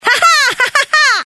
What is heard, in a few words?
giggle; chameleon